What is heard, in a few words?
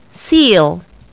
seal